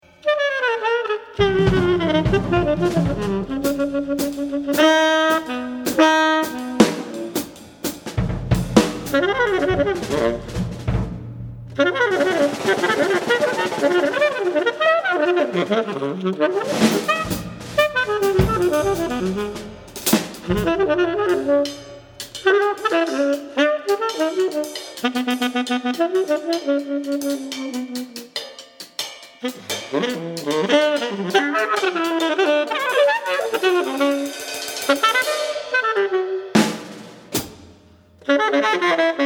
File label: HIccups